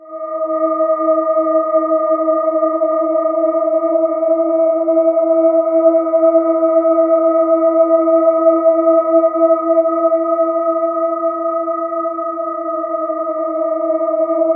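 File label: boat wreck, ominous drones; USB Soundscan vol.48; AKAI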